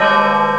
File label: churchbell; ST-XX Amiga Soundtracker Sample Packs